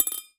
Drop In Glass, Medium Wine Glass

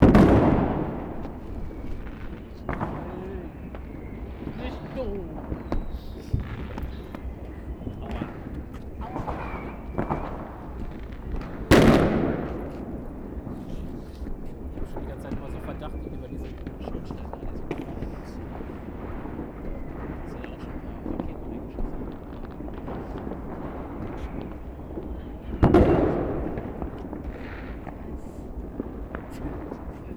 Fireworks; SoundScapes

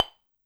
Tink, Kitchen

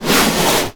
Snare Drum; Percussion Samples

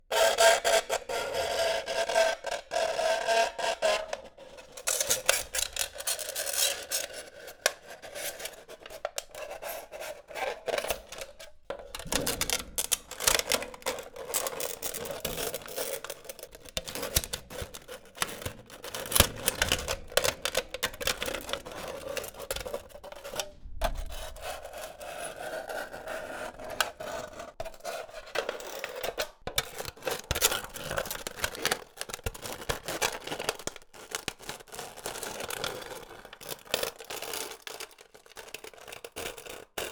Beer Tin, Drinks Can

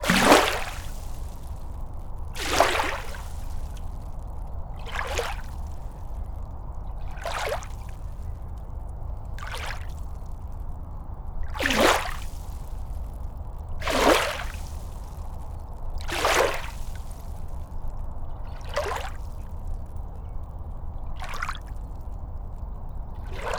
Gentle waves Oxford Island Lough Neagh; Sound Scapes

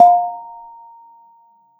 Bonang P, Gamelan

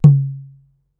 Kendang, Gamelan

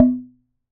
Wooden Bar Strike

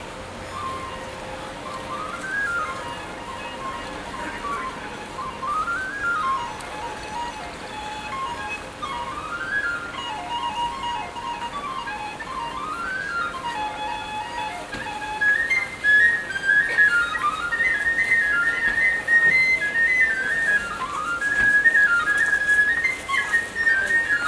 Tin Whistle Busker Belfast; Sound Scapes

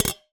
Ricochet, vase

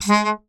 Melodica Short Note

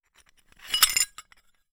Hole Saw Rattle, Hole Saw Bits

